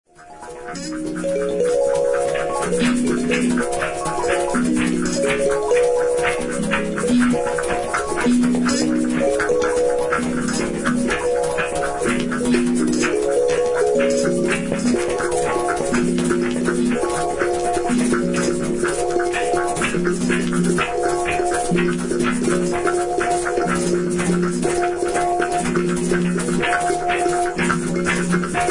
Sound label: AMBIENT EXPERIMENTAL